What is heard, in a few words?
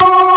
ORG VK, B-3 ORGANS Vinta Keys B3